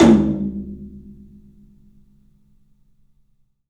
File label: 3-D Percussives, LOW Toms